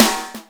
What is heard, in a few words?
snare; Breaks N Beats Sound Kit; Beatz Kit